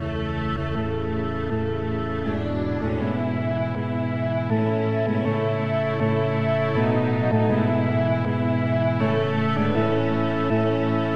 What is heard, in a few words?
polyinstru; hymns; Himnario grande